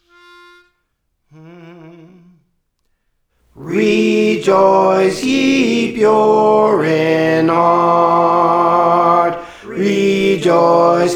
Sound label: Tenor, Praise For The Lord Training